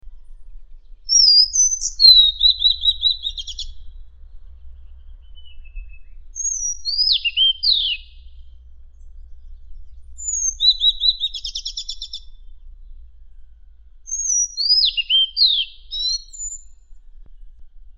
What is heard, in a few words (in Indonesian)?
Kacer Madagaskar